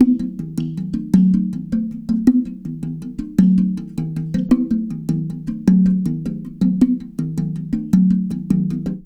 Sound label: WHALE DRM; Spectrasonics; Liquid Grooves 1 & 2; EUPHRATES